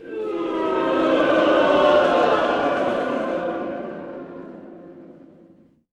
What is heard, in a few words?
crowd boo